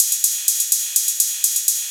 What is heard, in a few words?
808 Rides Loop 125, Old Skool House